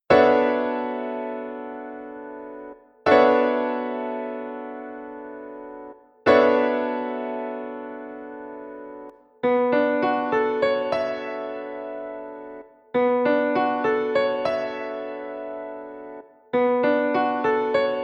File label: Bm11 Piano Chord